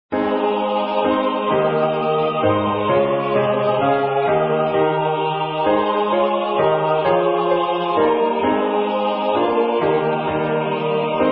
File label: Quem Pastores SATB